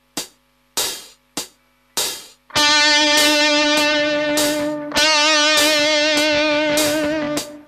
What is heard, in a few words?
vib